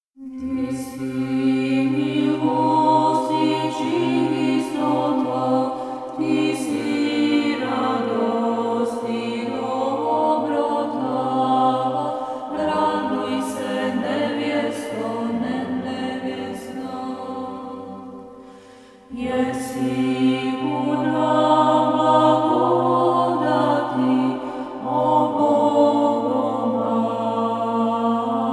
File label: Choir; Agni Parfene